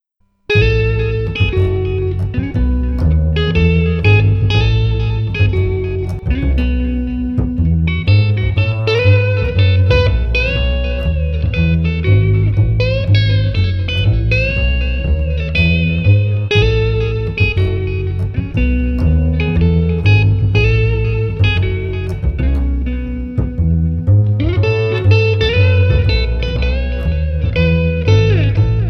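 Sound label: Street Jazz Trio Gratified no Drums, 120 bpm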